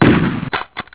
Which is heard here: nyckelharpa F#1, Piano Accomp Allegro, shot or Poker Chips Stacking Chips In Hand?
shot